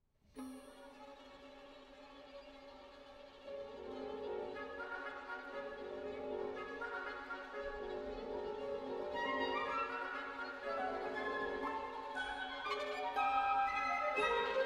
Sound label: Violin Concerto no.1 op.35